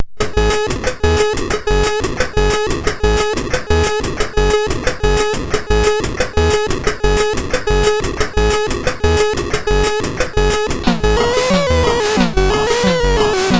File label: High Energy, MOS6581R2; sid